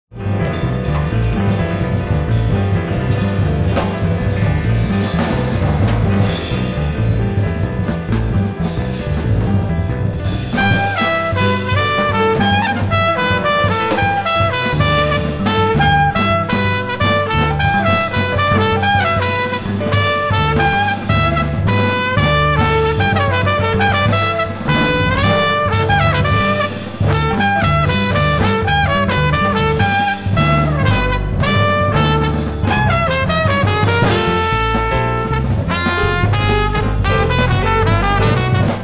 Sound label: Quartet Interlude